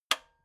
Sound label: metal button radio spring low